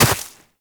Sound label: Run Snow, Footsteps; Warframe; Characters; Operator